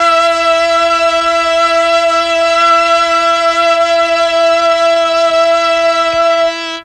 MEL STR, Legendary M400; ILIO; Propeller Island

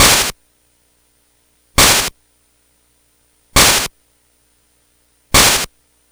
Game Boy Noise Crash Loop; 8-Bit; Chiptune; Nintendo; Drums

